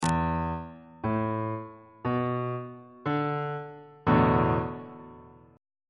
e dominant 7th suspended 4th chord on bass clef

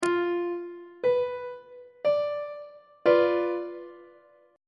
a double sharp diminished 2nd inversion; treble clef

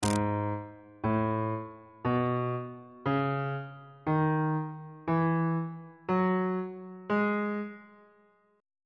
g sharp phrygian mode note positions on bass clef

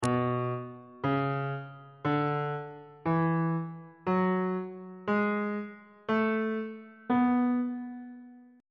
b dorian mode note positions

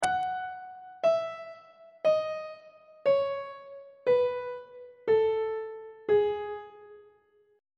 g sharp phrygian mode descending on treble clef

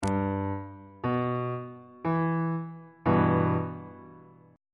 e minor 1st inversion on bass clef

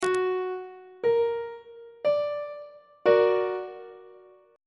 f sharp augmented chord on treble clef

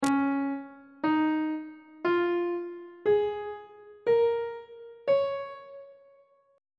c sharp major pentatonic scale on treble clef

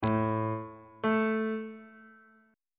g double sharp note

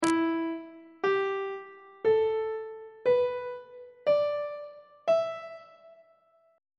e minor pentatonic scale on treble clef

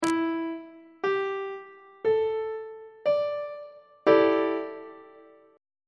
a dominant 7th suspended 4th 2nd inversion; treble clef

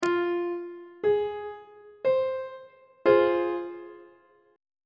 e sharp minor chord on treble clef

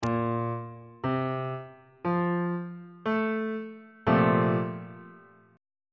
b flat major 7th suspended 2nd chord on bass clef

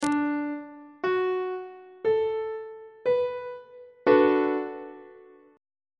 c double sharp major 6th chord; on treble clef

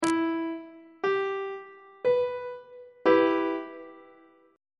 d double sharp minor chord; on treble clef

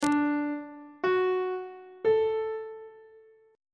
c flat dorian chord 3 is e double flat major; on treble clef

